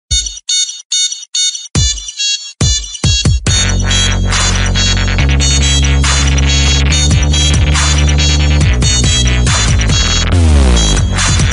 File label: dubstep ringtone; nokia dubstep; nokia ringtones